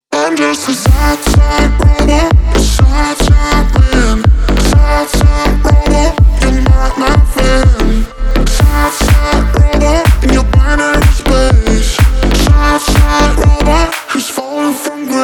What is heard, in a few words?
sad robot